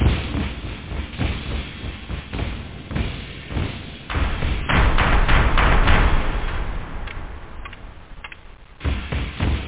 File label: break; da capo vol1; instruments